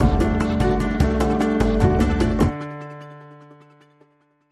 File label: Bumper